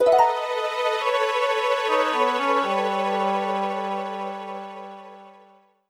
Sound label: Surprised; StreetPass Mii Plaza; Ultimate Angler